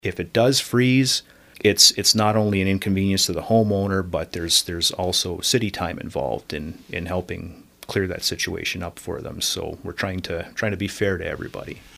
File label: WAARA PIPES